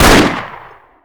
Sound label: Gun Pistol Shot; Weapons